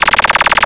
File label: Arcade Movement; Video Game Sounds